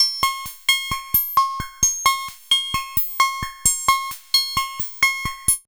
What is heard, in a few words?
RHYTHM FM